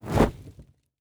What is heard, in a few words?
Fire Burst; Survival Game Sounds - Lite Edition; Environment